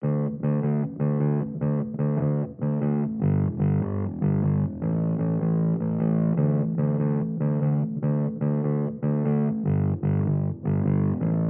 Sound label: bass